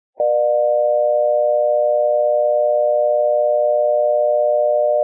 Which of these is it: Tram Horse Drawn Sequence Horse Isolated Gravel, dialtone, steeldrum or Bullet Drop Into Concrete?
dialtone